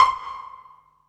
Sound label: PIZZ, Dance Mega Synth